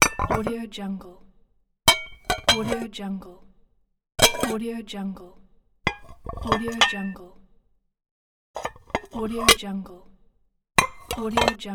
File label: plates